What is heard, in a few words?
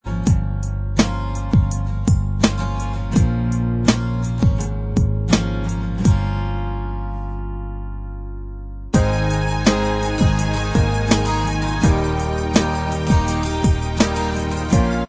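Instrumental Track, backing tracks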